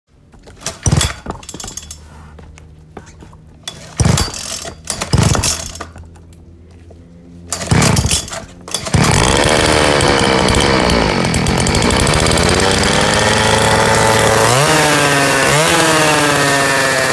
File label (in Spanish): Encendiendo y acelerando una motocicleta; Efectos de sonido transporte